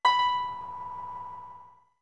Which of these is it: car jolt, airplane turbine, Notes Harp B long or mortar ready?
Notes Harp B long